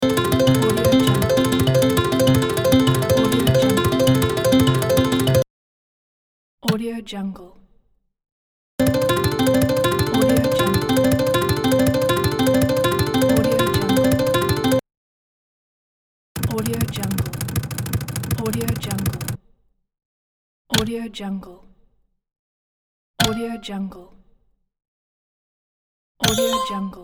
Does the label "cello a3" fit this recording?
No